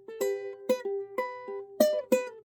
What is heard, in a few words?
slide up; dyslexia; writeka